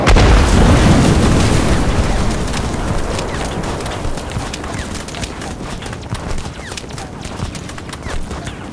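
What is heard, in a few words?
tank explosion; kolmods proj impact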